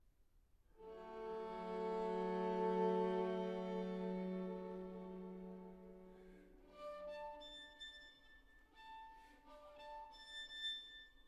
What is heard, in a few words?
Lullaby for String Quartet; Classical